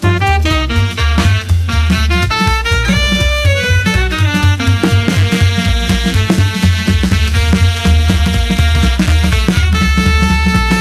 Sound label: postbop jazz 240bpm